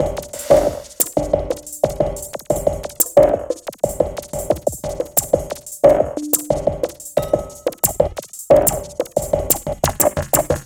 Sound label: jungle burp 090bpm; Zero-G; Downbeat Dance Grooves